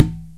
CONGA SET; OMI - Universe of Sounds

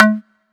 Tom, Drum Hits; Zero-G Trance Inducer; 90 s Sample CDs

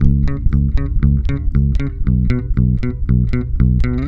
BASSE, Roots Disco; C#M; USB Soundscan vol.32; AKAI

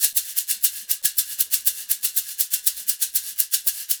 120 SHAK, Percussion Loops; USB Soundscan vol.36; AKAI; SHAKER

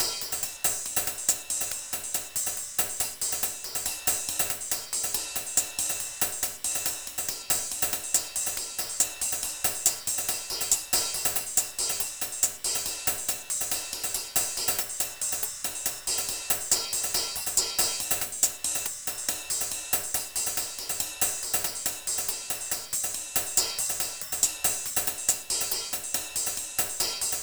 Cymbalz N Hatz, 140, Percussion Combos; M-Audio; Pro Sessions VOL-38; Abstract World Fusion 2

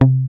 GUI MUTE, E-Gui Muted

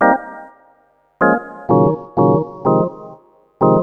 Organ chops (125BPM), retro house

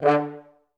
FH STAC D2; Best Service; Peter Siedlaczek's Advanced Orchestra

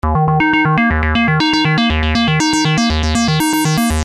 Arpeggio 120 D#, Techno Synth Loops; Sonic Foundry (Sony Creative Software); Signals I

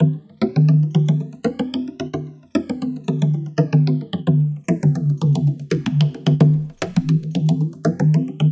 conga, CONGAS; Spectrasonics; Liquid Grooves 1 & 2; CONGAS+BONGO

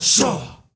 SHAWSHAAB L, GRP CHANT, Heart of Africa; Spectrasonics; Heart of Africa 1

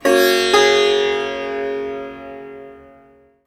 SITAR CHORD; SITAR CHORDS

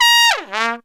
TRUMPET FX; Zero-G; Phantom Horns

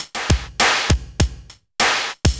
BPM100 SYN

